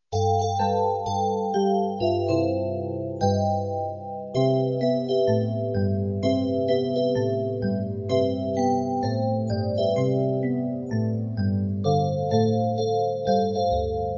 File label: Jingle